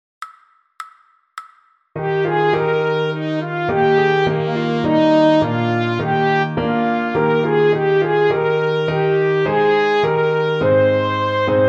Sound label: trumpet